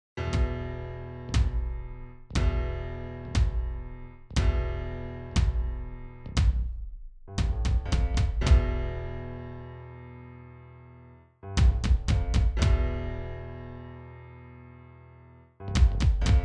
MIDI track

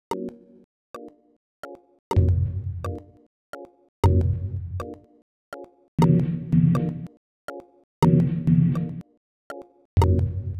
sinewave

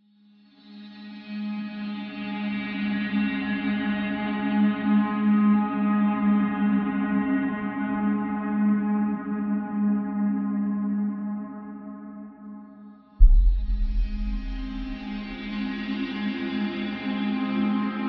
Sine Relax Song

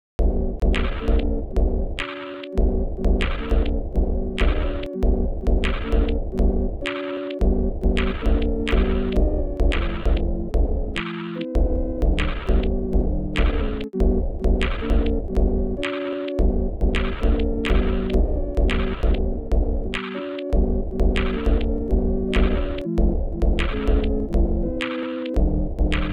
sine wave

